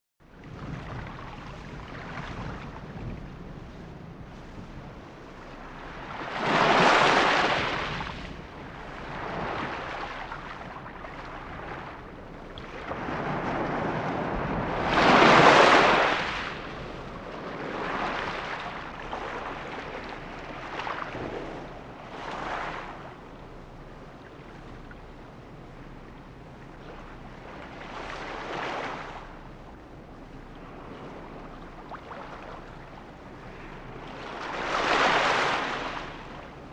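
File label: Water Lapping Closeup, laps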